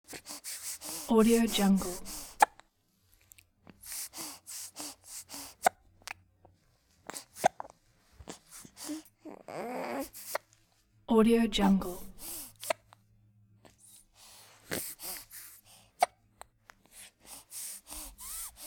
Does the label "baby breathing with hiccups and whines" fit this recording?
Yes